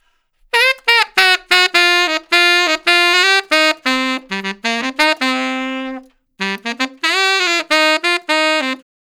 Tenor Solo, Jazz, Traditional, Tenor Sax; Sonic Foundry (Sony Creative Software); Jazz Solos and Sections; Tenor Loops

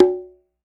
Conga Hi; Roland SPD-S

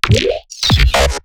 glitch stab; Virtual Riot; Glitch Stabs

